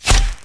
Mace Scale, Damage

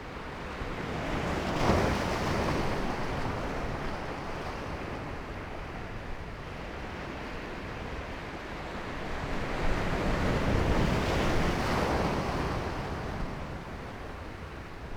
Sea Wave, ambience

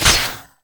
Sword cloth, Damage

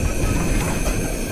COMBAT BOOSTER; Unit; GOLIATH

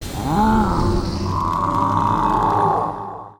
WAR POWERUP

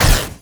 Mace cloth, Damage

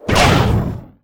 air blast

